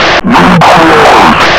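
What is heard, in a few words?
zombie grenade attack; svencoop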